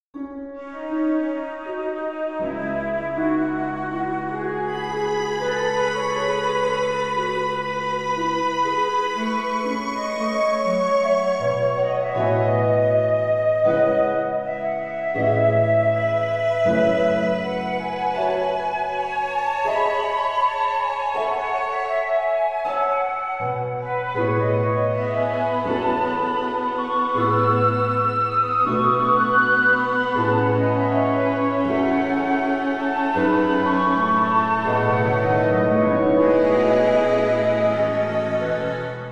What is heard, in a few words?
Concertino Flute Harp